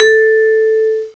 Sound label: vibes, mallets; CMI Sound Library Rev 1.3